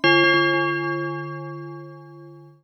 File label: Tubular Bell